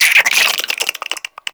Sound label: Infested Minion Attack; Warframe